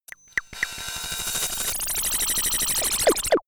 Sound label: rhythmic arcade; bewegung; comedy-cartoon; soundpool